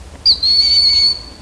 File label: broad winged hawk